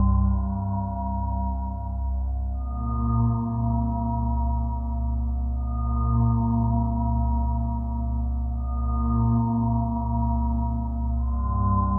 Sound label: SPHERE D+B 160; eJay